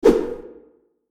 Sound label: whoosh